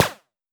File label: Fireball Explosion, Laser; Run-and-capture; Sound Pack 01.09